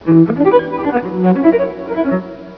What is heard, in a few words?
bow violin